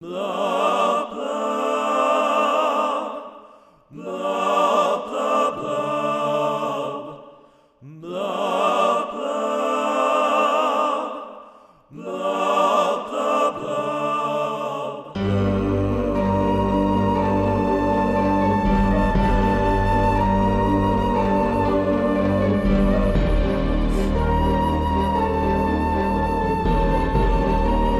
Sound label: Song Blub Choir; Octodad Dadliest Catch